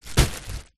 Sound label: apples bag drop into cardboard box